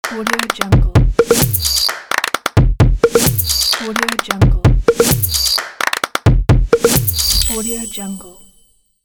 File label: logo claps